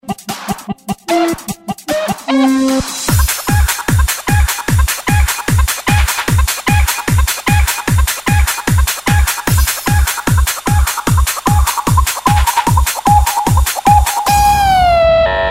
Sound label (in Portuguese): danca do galo remix